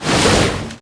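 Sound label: tentacle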